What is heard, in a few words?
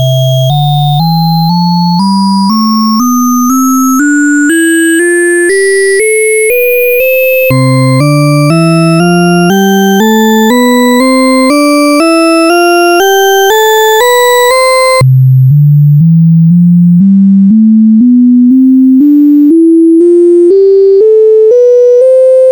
scales; The Weierstrass Function